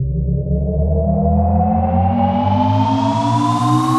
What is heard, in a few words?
charge; cybermachine